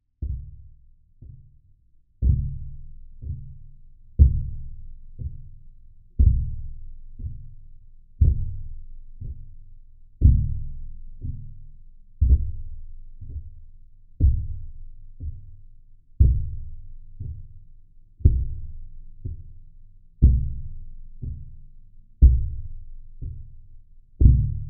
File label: Marching Pulse; Remembering